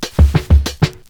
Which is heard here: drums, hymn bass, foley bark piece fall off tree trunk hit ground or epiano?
drums